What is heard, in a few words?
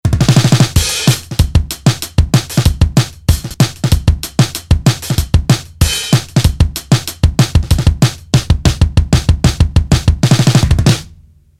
Lo Fi Beat alone